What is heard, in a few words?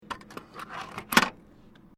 Vending Machine Coins Collected, Japanese vending machine; Machinery; Machines